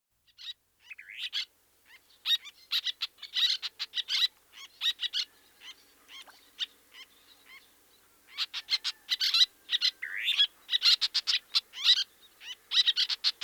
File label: Little Tern